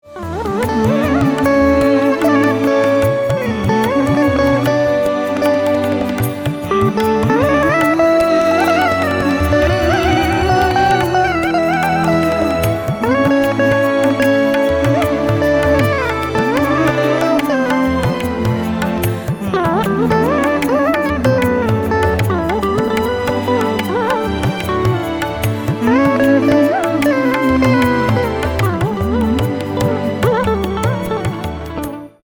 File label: ringtone instrumental bhajan; Bhajare Ram Charan; radiosai